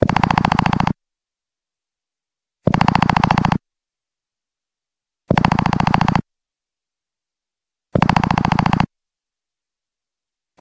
nothing phone ringtone

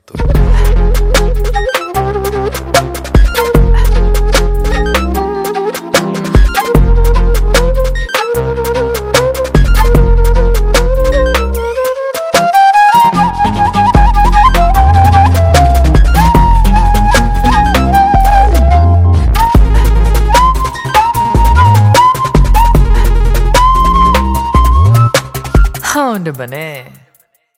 flute ringtone; android

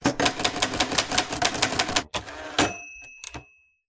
typewriter ringtone; message

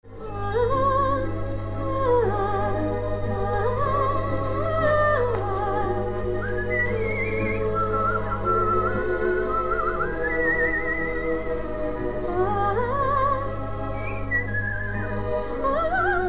shot gun ringtone; shrek

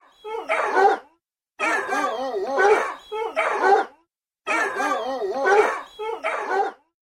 dogs barking ringtone; android

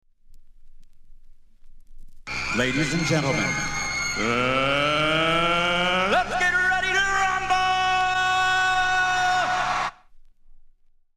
boxing ringtone

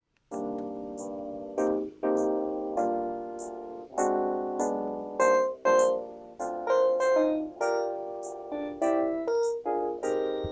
panasonic ringtone

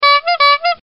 comedy ringtone

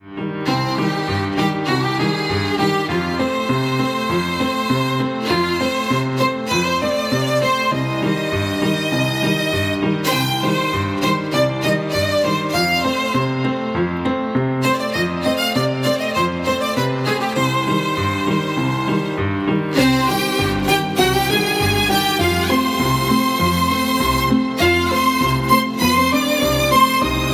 violin ringtone; android; sherlock

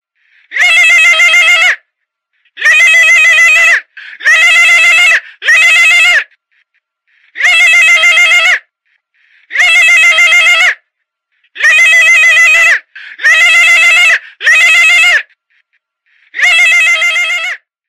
lu lu lu telephone ringtone; android

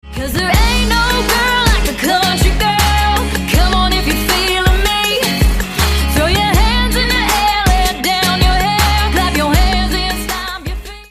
country girl ringtone

